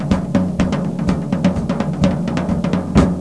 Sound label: drums